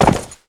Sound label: horse jump end; Mount&Blade Warband